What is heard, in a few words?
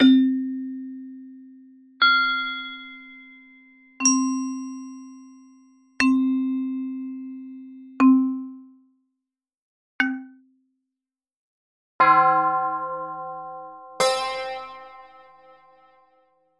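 percussion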